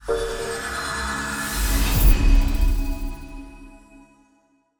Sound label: levelup; spacemap